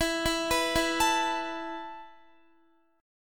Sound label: E Suspended 4th E down Guitar; arpeggiated; Esus4; 4F,4A,5C,5G,5C,6E